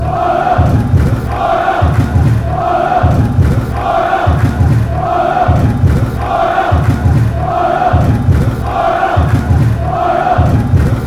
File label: gent chant